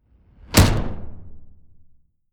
sci-fi shot rough

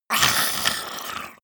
monster throaty assault; kreaturen

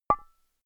glass short massive; signale

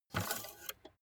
car bmw e46 exterior oil rod insert fast

